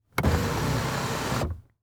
Transportation Chevy Trailblazer Open Sunroof Short